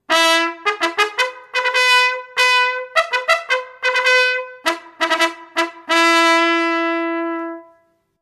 Unteroffizier Ruf

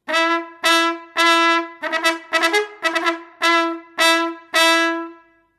Schritt

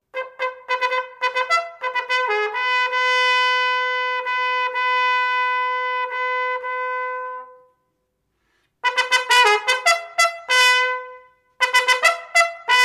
Wecken Post

